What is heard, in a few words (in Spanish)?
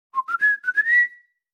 silbido; TITANPRO